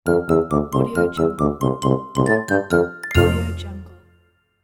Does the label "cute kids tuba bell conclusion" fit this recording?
Yes